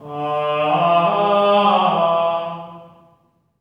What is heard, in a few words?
GR ALLELU, Symphony of Voices; Spectrasonics